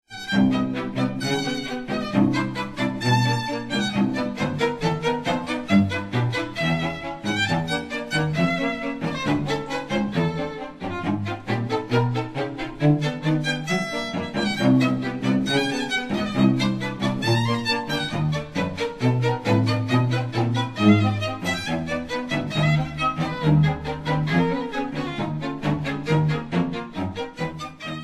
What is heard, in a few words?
String Quartett